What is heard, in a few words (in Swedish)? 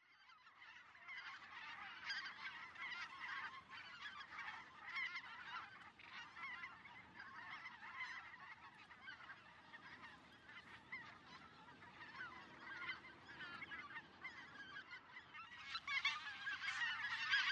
ljud blasgas